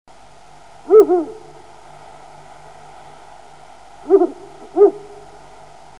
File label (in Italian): Allocco degli Urali, uccelli